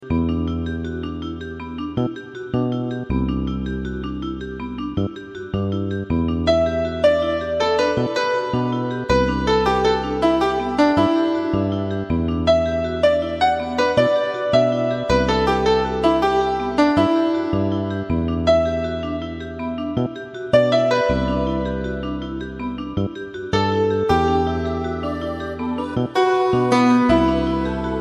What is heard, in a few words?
movie song; Sottofondi